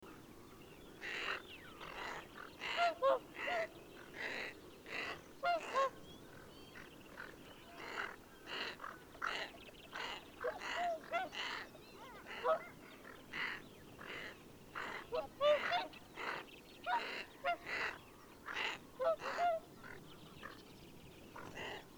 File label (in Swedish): Bergand; Greater Scaup; Aythya marila; Patrik Åberg